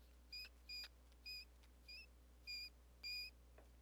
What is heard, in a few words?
Long Squeak Series, rat